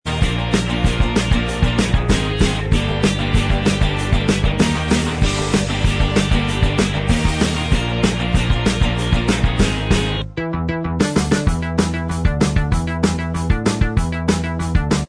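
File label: instrumental track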